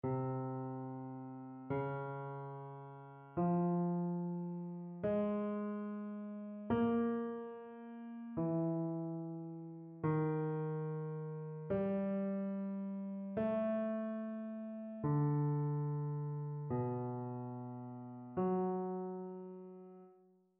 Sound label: row dictation; ear training